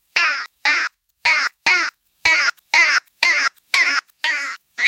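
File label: running paws playful meow, pet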